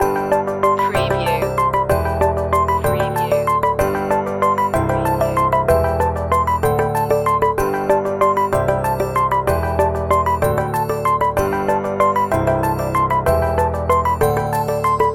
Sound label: light signals loop